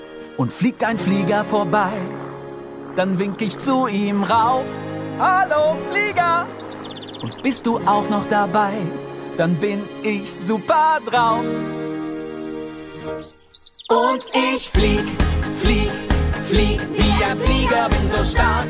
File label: sport car